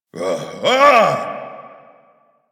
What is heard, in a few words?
Breaths; Kahl; Warframe; The New War; Skiff Crash